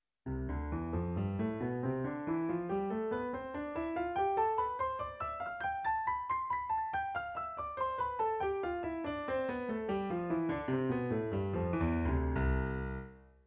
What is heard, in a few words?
scale C